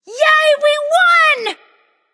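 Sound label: yay, female; smite; voicepack; hera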